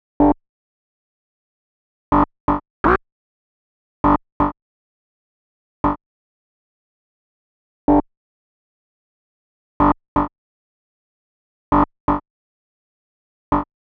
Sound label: 125 G Synth, Complex Electro Synths; Prime Loops; Explorations